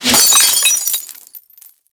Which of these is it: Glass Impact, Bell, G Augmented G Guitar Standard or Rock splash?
Glass Impact